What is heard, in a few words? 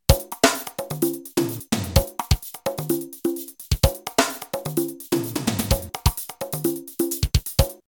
drums